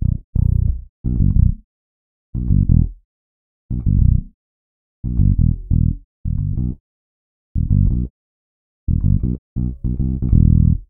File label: bassline